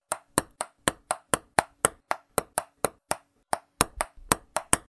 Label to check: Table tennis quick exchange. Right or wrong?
Right